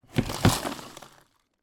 food dry cereal pour from bowl into bin

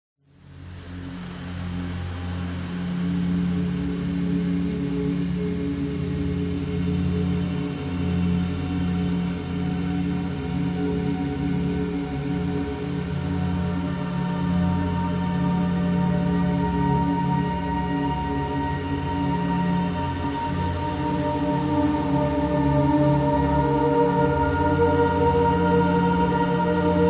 horror musical nightmare sinister dark ominous

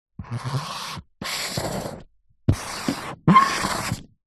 office marker large draw lines whiteboard

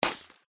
inflatable toy puncture

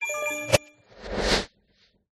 Ice Spell Alert; Sound Spark

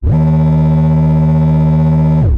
science fiction door slide open just motor sound

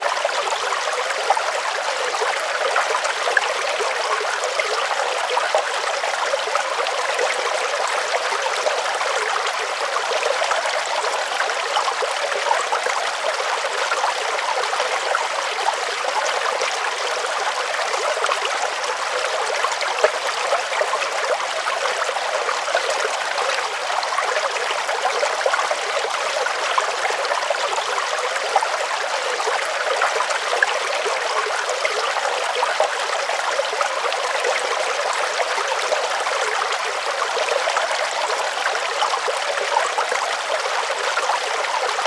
water stream forest; sound effects one

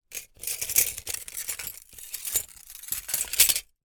foley glass peices shards handling movement

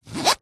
wallet leather pocket zipper; foley; zapsplat

